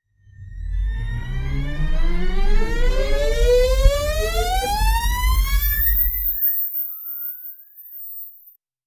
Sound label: futuristic brand launch s, launch